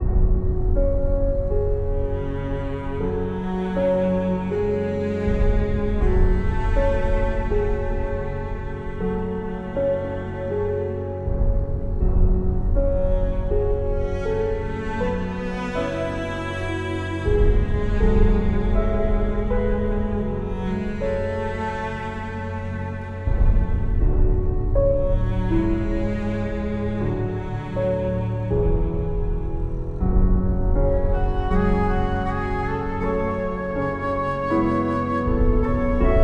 Orchestral Ambient

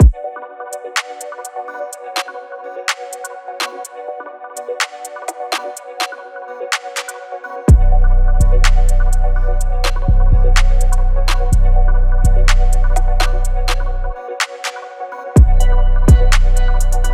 Hip Hop Galactic; Hip Hop Music Pack Vol. 3

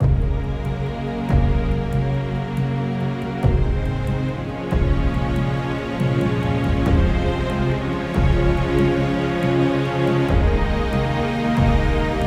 Orchestral Ambient Spring; Orchestral Ambient Music Pack

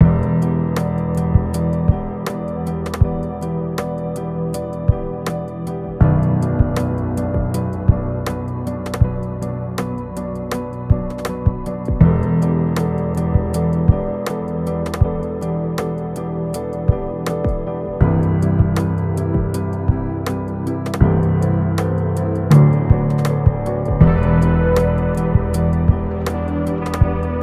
Hip Hop Swangy; Hip Hop Music Pack Vol. 3

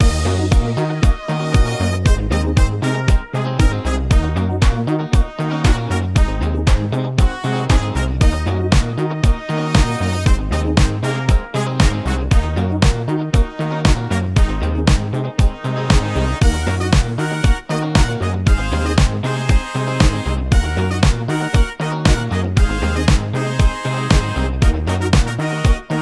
Funk; Funk Music Pack

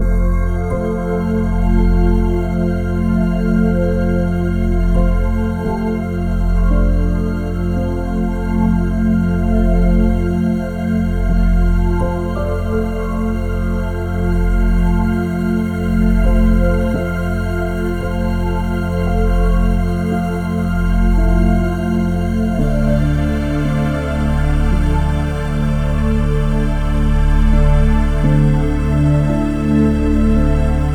Ethereal Wind Chime; Ethereal Music Pack

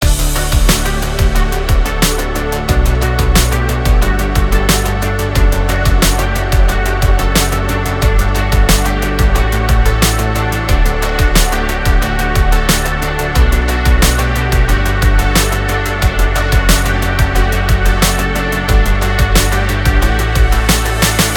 Synthpop Apollo; Synthpop Music Pack

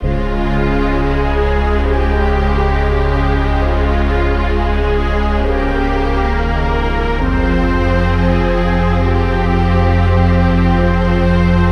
Orchestral Ambient; Orchestral Ambient Music Pack Vol. 2

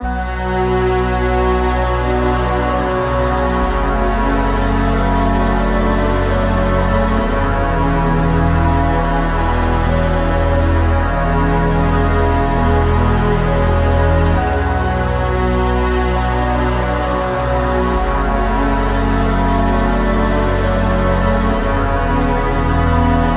Orchestral Ambient; Orchestral Ambient Music Pack Vol. 2

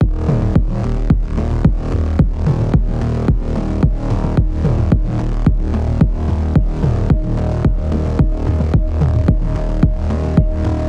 Electronic Ambient; Electronic Ambient Music Pack

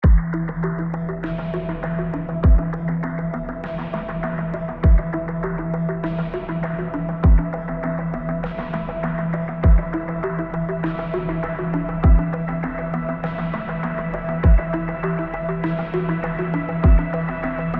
Ambient Battle Tech; Ambient Music Pack Vol. 6

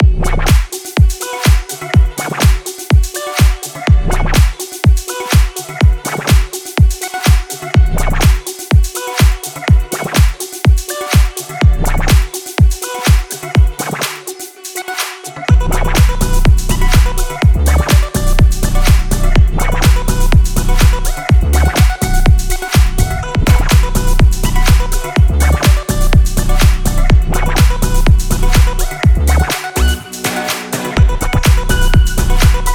Heavy Electronic; Heavy Electronic Music Pack Vol. 2

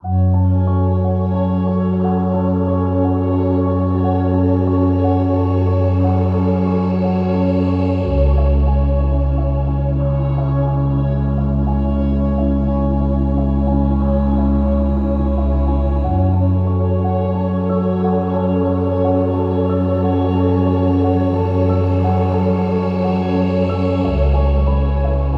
Ambient; Ambient Vol 4 Music Pack

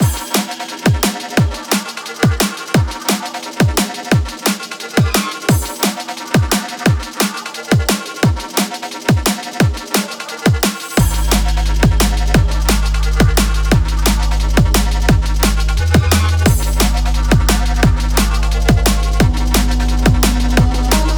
Electronic; Electronic Vol4 Music Pack

